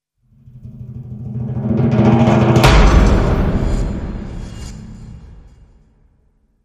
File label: Roll Hit, Sting